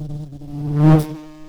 Lapidarius queens, bumblebees

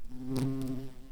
bumblebees, Lucorum queens

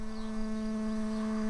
bumblebees, Hypnorum workers

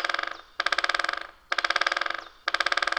Dendrocopos major

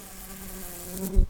Humilis workers, bumblebees

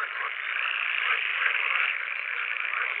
Pool-Frog, frogs

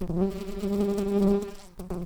Pascuorum workers, bumblebees

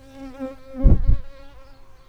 Recording, bumblebees, Sylvarum workers

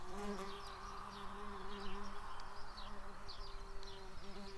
bumblebees, Hypnorum workers

